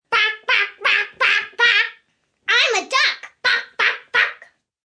Duck I'm A Duck, Imitation, 1 child imitating a duck; Exclamation